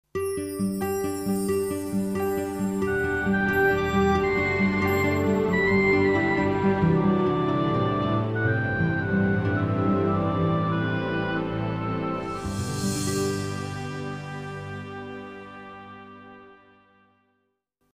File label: soft intro